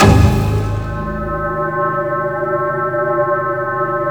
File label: G#3 harp; Northstar; The Wizard VOL-1; BLACK HAMMER